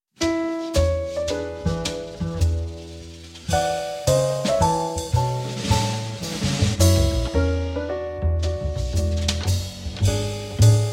In A Major Arr. For Jazz Ensemble

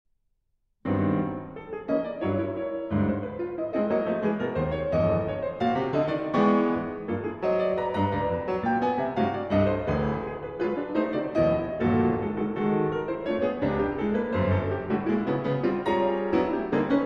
Piano Suite, Marsch. Allegro

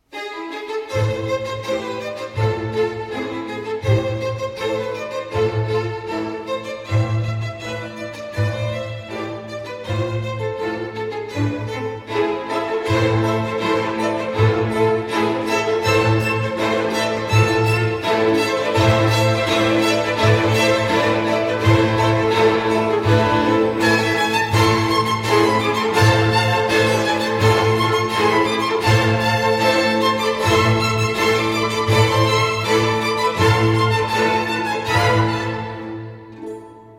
Version For Violin and Orchestra, Allegretto; 6 Gamle Bygdevisur Fra Lom, Op. 2; No. 4

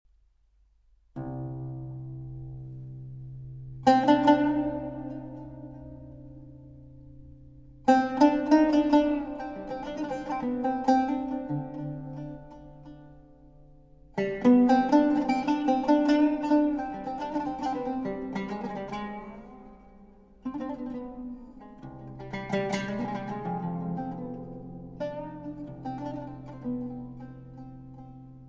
Improvisation Oud

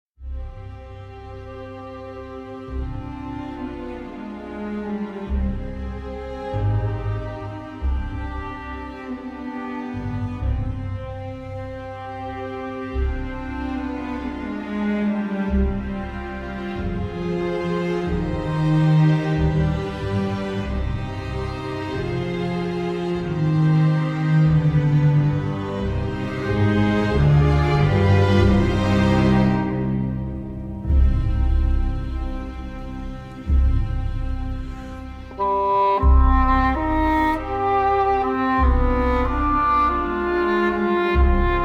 English Horn; Minuet in C Minor

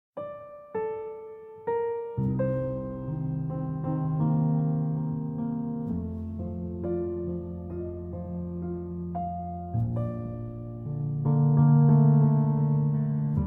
Solo Piano

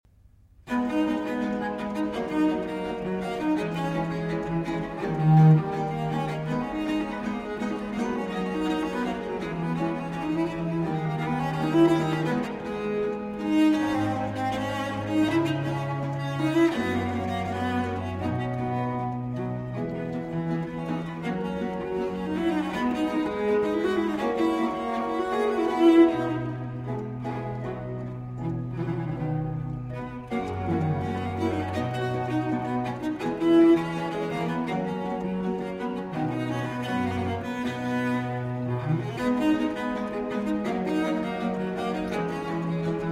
Cello Sonata in A Minor, Allegro Poc; Vivaldi; RV 44; 2. Allegro Poco

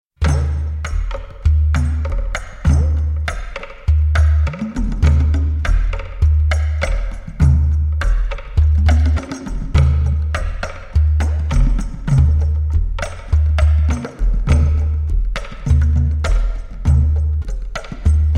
Talking Drums

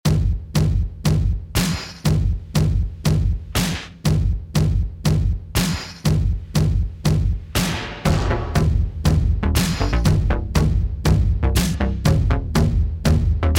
Instrumental

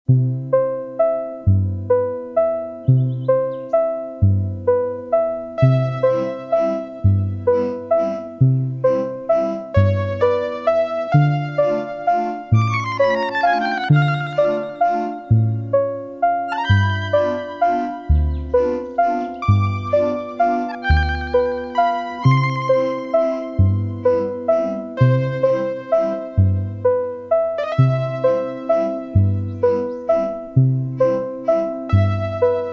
Waltz

